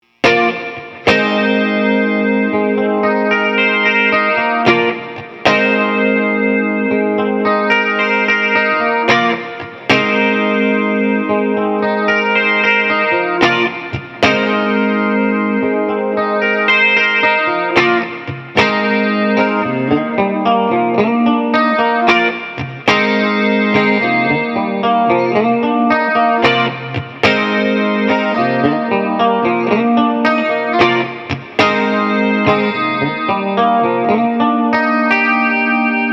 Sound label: sine